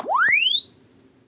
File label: Press Two Sides Chirp; Samsung 4 Recordings